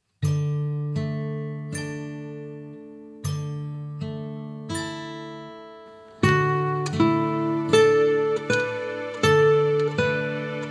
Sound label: Chimes Twinkle